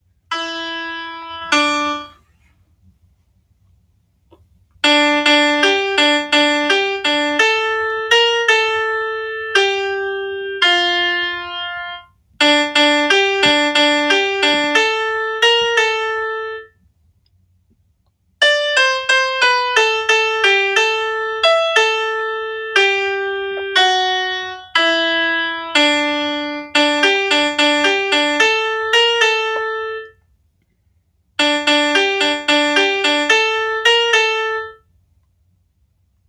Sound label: melody